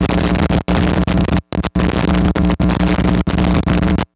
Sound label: Technology Electronic Electric Arch S M L Short